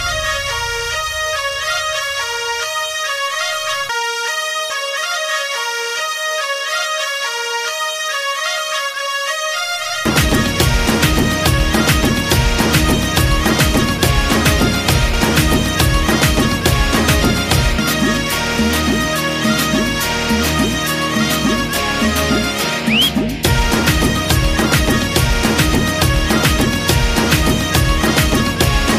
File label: Kick